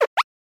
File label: Motion Pop